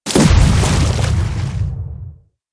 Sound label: big water; pond5